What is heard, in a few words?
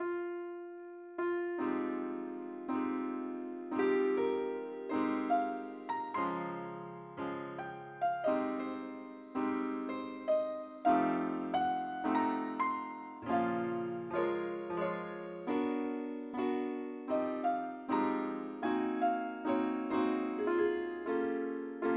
piano